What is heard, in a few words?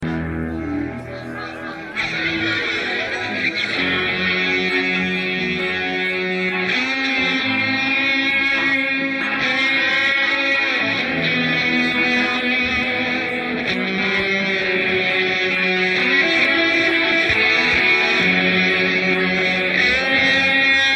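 Solo Front Mix, guitar; Pulled from 5.1 Mogg Mix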